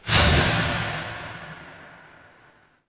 magic scifi portal

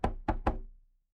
impact wood knock

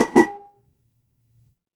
glass ceramic

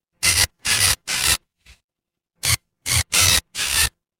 mechanical gear

